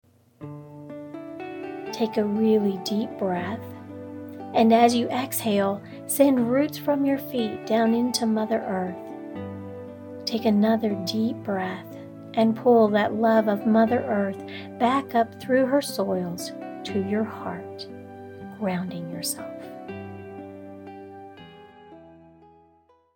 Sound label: Deep Breath